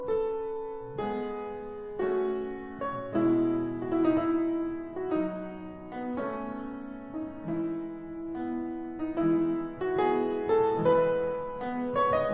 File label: Piano Sonata in G major Molto adagio sostenuto e cantabile; Op 40 No 1